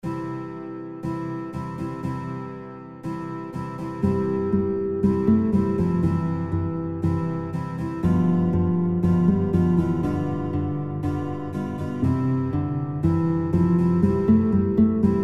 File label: Guitar, kinderlieder gitarre playalongs; Guitar Songbook; Lasst Uns Froh Und Munter Sein